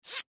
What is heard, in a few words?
Plastic Cable Tie, Plastic Cable Tie Pull Tighten; Hardware Sound Effects; Machines; Foley; Blastwave